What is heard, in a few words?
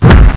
foot; MONSTER; minos